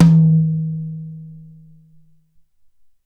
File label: mid tom drum one shot E key, tom drum single hit tuned to the E note